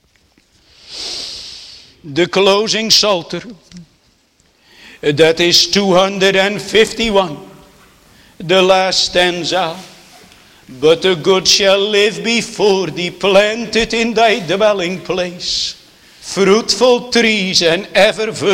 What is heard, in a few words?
Singing; Netherlands Reformed Congregation, St. Catharines, ON